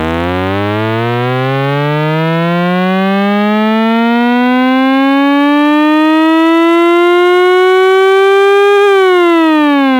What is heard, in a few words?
raw crank